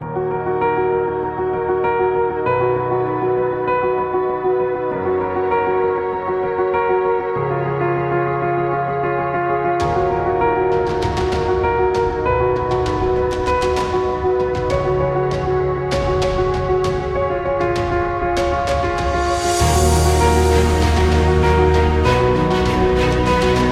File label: Epical Motivation, epic music; epic music vol 4